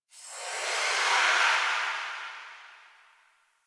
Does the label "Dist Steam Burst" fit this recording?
Yes